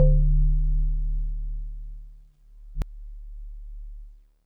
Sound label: GVibes DX100 D1; good vibes